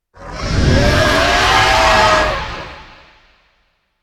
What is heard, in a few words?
Tap; Yu-Gi-Oh! Master Duel